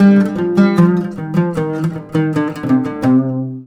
LUTH E, Arabian Traditions, LUTH RIFF; USB Soundscan vol.43; AKAI

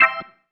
Stab, Organ, One Shots

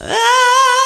Ahhhhhh; Mo' Phatt; Digital Sound Factory Mo' Phatt

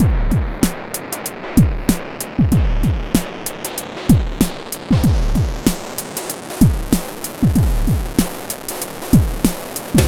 DOOM BOOM 95.2 bpm; Planet of the Breaks

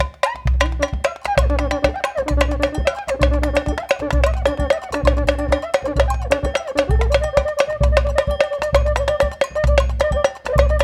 130 bpm, Rio batucada

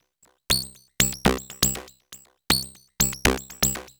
Synth

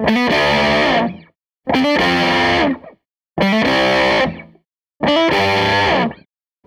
SG Guitar Loop

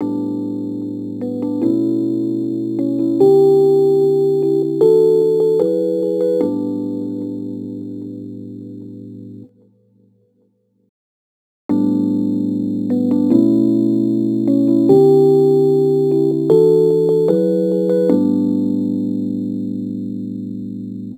Rhodes Attacks; Ueberschall

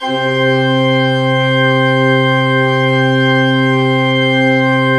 C2, Cathedral Organ, MANUAL PLENO; Propeller Island

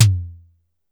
Drum X Tom, Pearl Drum-X

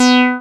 SYN TBM C5, MC202; Sound & Vision - Gigapack I CD 2

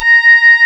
Synclav FM, WIND, A#; Big Fish Audio

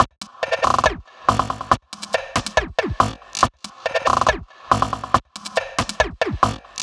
140 drum loops; Glitcheee; M8; Electronisounds

